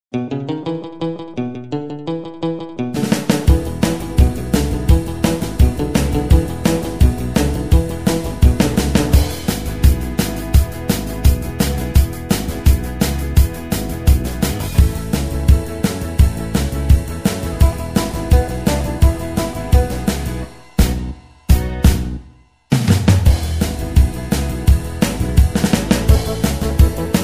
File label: Beat, KORG Styles